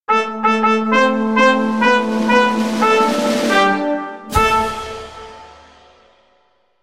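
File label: sounds of fanfares and solemn award ceremony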